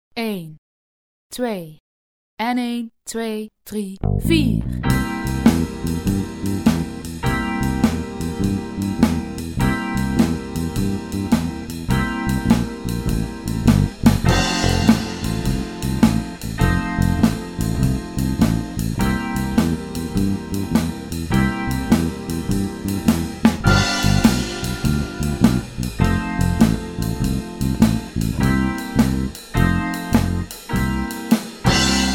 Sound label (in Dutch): begeleiding bluesschema